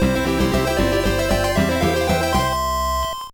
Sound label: Jingle; Futari wa Pretty Cure Arienaai Yume no Sono wa Daimeikyuu; GBA